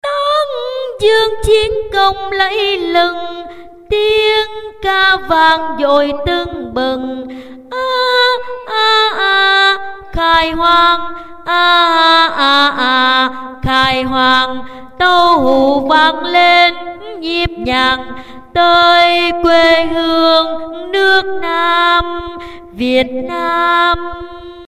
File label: basso cao